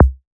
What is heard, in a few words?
kick; bespoke - Floral v.1; drum oneshots